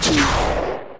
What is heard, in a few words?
rocket launch; Gotham City Speed